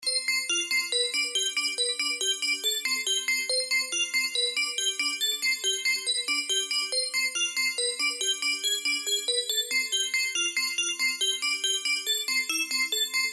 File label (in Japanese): シンセベル mix